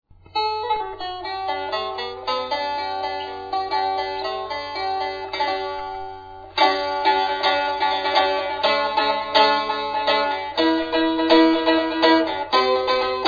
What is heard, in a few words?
stick dulcimer